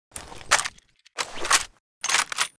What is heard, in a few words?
uzi reload; STALZONE